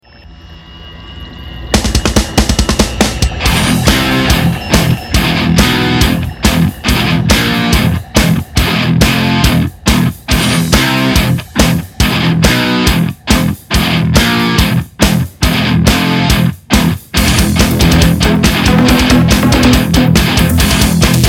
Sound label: Metal; Kuassa